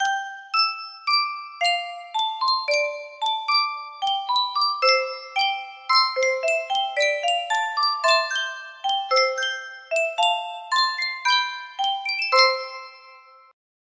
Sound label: sankyo music box